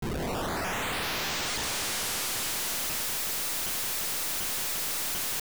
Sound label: Vanish; Koholint Islant vanishing